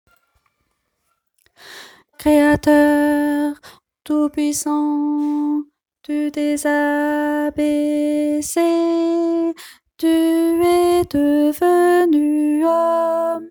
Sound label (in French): alto